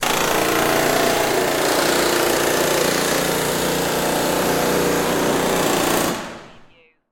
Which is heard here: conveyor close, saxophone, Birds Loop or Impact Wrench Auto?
Impact Wrench Auto